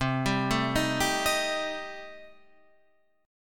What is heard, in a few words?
C Minor 6th, chord